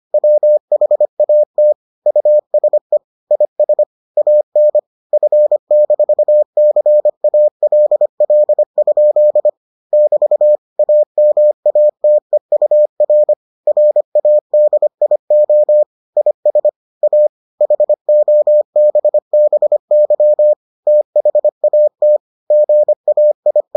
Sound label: morse